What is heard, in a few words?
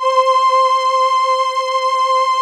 VP-330, String Machines